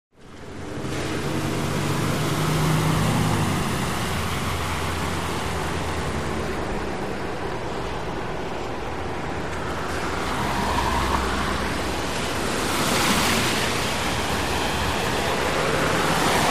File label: Wet Traffic Bys Med